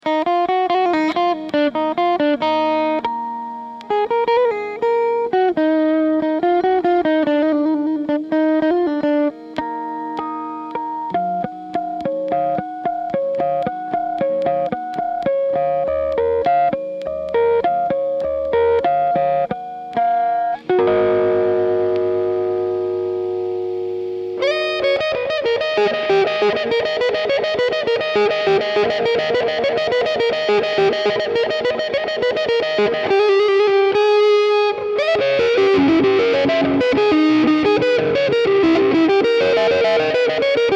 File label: Pedal Paraphrase